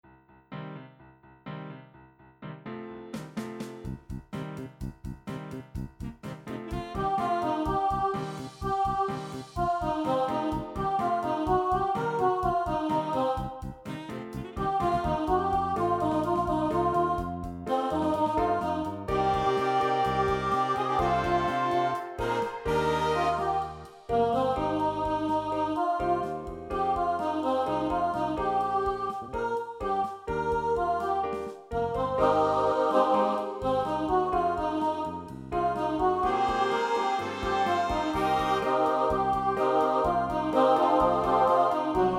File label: SATB